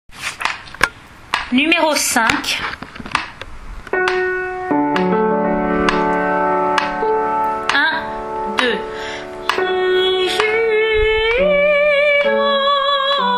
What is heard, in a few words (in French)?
Brahms requiem allemand soprano; 5.Ihr habt nun Traurigkeit